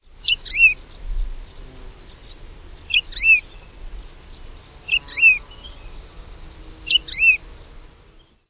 alouette lulu

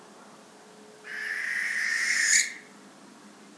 effraie des clochers

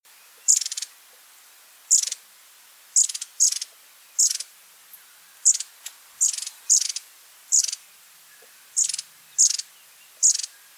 orite a longue queue

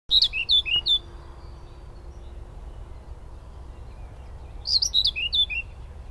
fauvette grisette

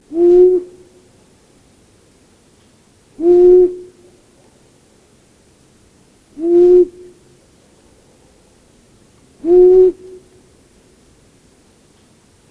hibou moyen duc

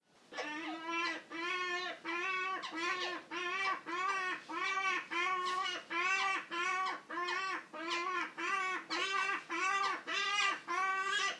choucas des tours

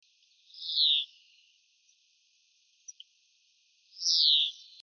verdier d europe

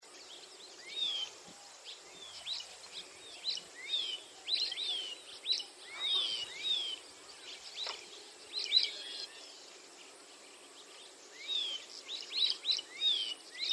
hirondelle rousseline

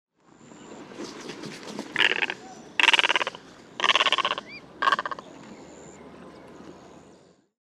grande aigrette

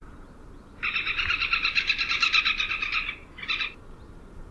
pic a dos blanc

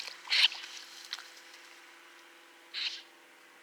becassine des marais

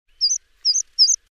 pipit farlouse

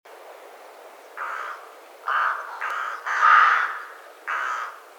corbeau freux

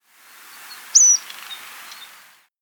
pipit a gorge rousse

